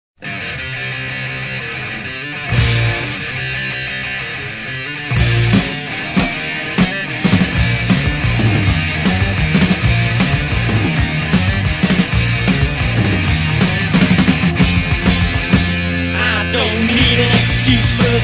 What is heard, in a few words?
Punk